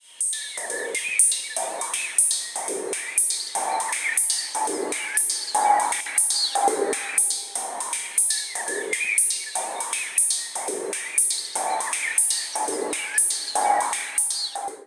Elektron Analog Rytm Sidechaining

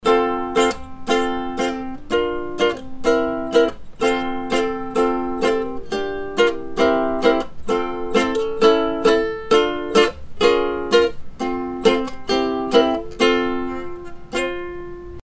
t.bone SCT2000 Ukulele

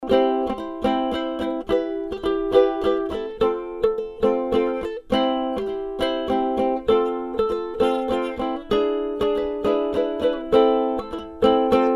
ukulele; tbone rb770

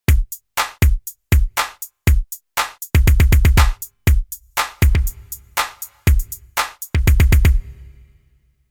HipHop; KOPRO+

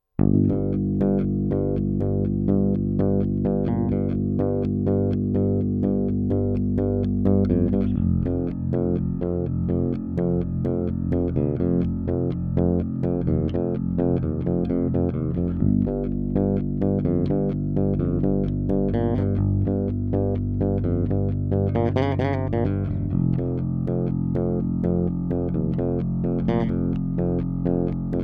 Superego Bass Groove

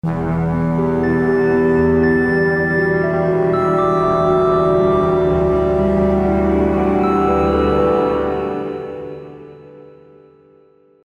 Digi Orchestra Sphere

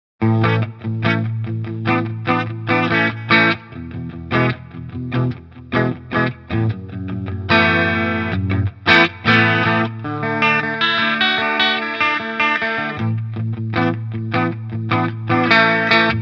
Chorus; Harley Benton MiniStomp Hot Mod

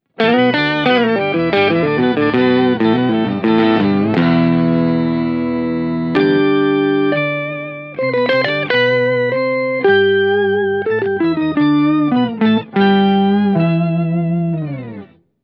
Walrus Audio Luminary Clean

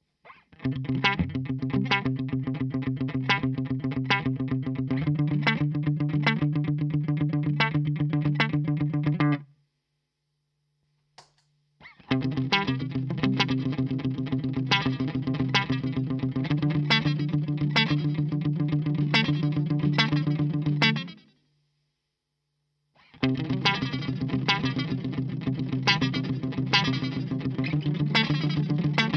Pitchshifting

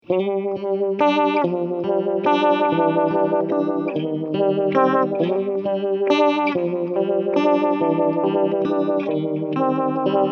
clean chords mod half speed full int

